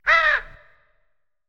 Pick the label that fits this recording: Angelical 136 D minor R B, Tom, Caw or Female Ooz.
Caw